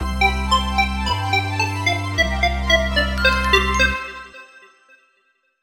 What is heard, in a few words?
chime